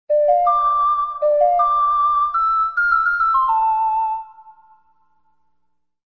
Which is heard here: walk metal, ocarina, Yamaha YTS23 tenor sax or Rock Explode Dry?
ocarina